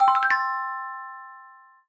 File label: chip minor